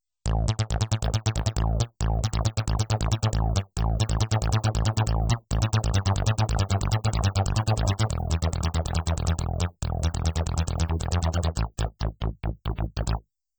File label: synth bass 1, Yamaha PSR-530